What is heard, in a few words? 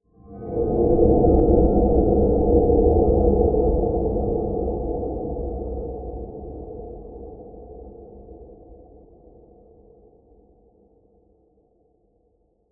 Whoosh dark rumble bass with metalic cascading notes at start long decay